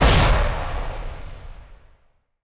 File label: hit; ships